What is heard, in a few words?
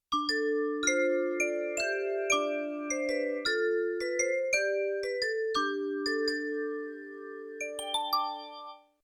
Vibes; Yamaha TX16W; Jazz Performance; Jazz Performance 2